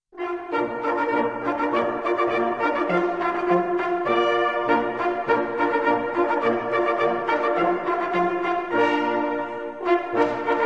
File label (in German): jaegermarsch, Jagdhornblasen; Brauchtum